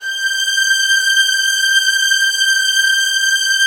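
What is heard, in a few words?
violin; Virtuoso Strings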